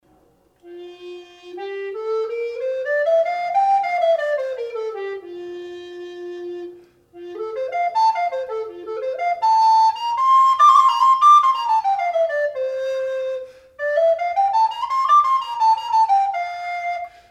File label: Noodling, recorders; Alto; 415; Stained Zapatero Boxwood; Morgan; Denner (Denner Edition)